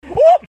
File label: OOH